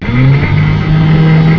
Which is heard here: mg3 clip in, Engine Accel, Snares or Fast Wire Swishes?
Engine Accel